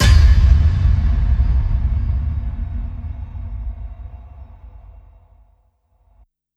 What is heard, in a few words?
Impact